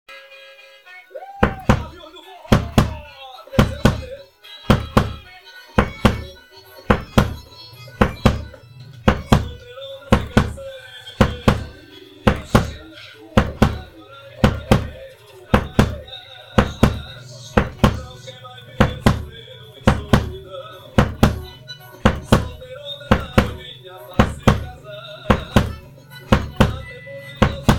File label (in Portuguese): ensaio de forro na batera drummer forro das antigas